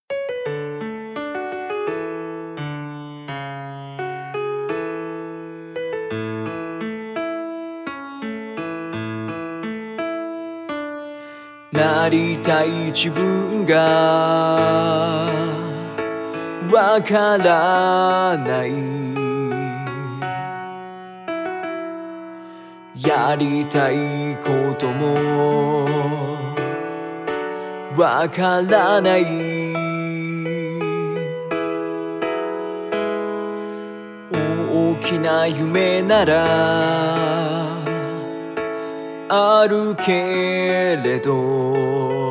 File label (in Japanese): 歌ver